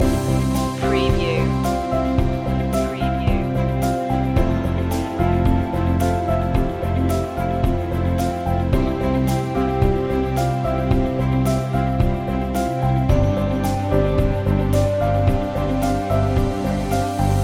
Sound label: look up loop